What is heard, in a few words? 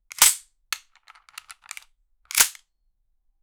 revolver shoot in wood; firearms